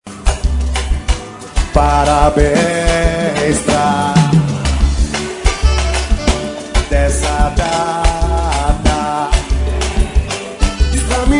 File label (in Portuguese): Parabens Pra Voce Em Ritmo De Pagode